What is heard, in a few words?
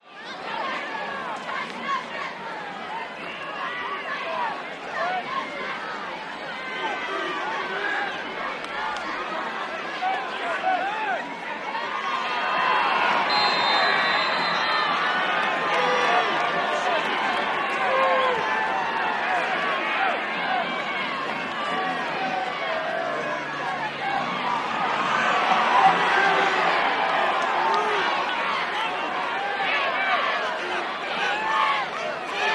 Crowd chatters and cheers with cheerleader chants during a high school football game.; Cheer, Crowd Crowds, Cheer Sports, Football